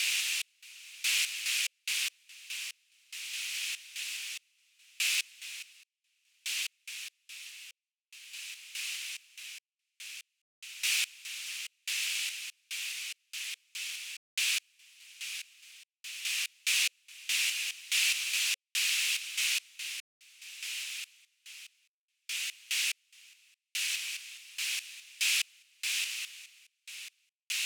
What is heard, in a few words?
Cm72 Zone Ambience